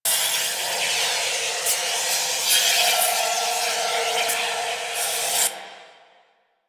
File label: Med Station Gas Loop; Warframe; Lasrian; Lasrian Soldiers; Weapons